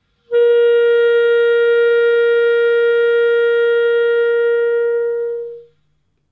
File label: Clarinet Note